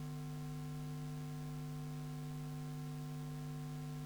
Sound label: hum; chinacart; draaitafels